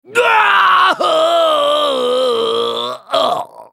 dying; Trundle

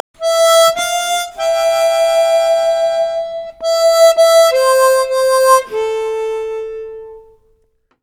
emote danceloop; LoL; yasuo skin01

